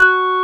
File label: Korg CX3, C 1